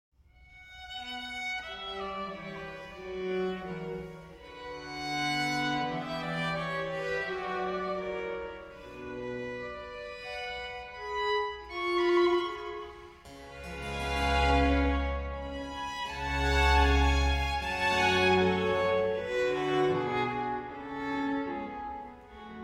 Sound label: Chamber Players, Concerto Grosso in D Major; Op. 2, No. 4